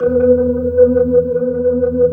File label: E2, Keyboards Old School; USB Soundscan vol.09; AKAI